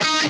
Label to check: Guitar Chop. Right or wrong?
Right